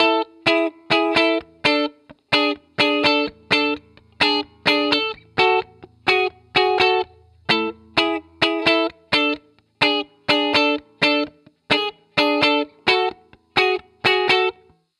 rythm F#min Bpm128, 70s Guitars; Fox Samples; Back to the 70s Guitars

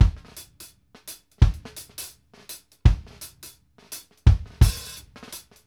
KD REGGAE 84; Sampleheads; New York City Drumworks VOL-1

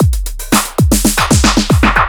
Funky at 115 Fill, Drums Beats

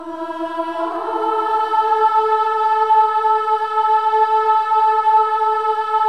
Voices, INTERVALS, 4TH UP; Spectrasonics; Symphony of Voices

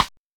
Oberheim DX

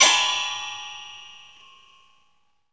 tines, percussive

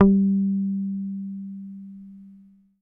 HOF SUS G3, Hofner bass; Sampleheads; Will Lee Bass Library

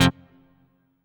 HOUSE, House Dance

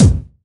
BD, BASS DRUMS; EdgeSounds

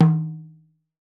NIGERIAN, African Perc

